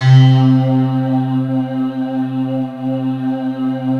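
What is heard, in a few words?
electric piano + VOX, Peavey DPM; Rubber Chicken Software Sample Bank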